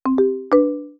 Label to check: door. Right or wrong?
Wrong